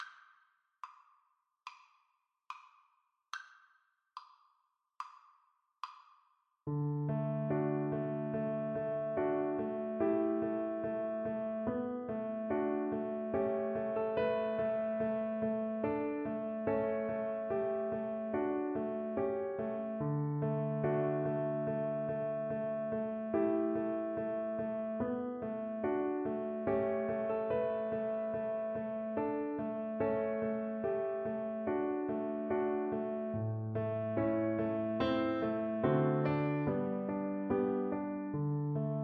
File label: violin